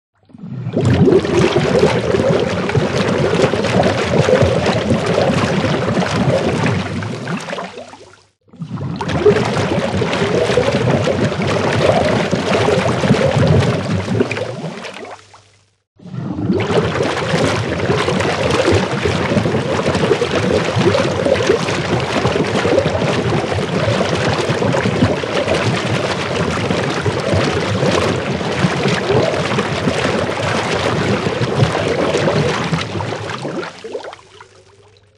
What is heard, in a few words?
Bubbles Heavy Consta